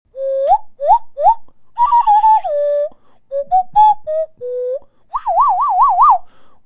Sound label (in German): Nasenfloete